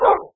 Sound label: bark, wolf; mob; Minecraft